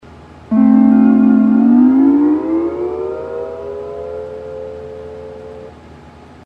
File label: hawaiian piece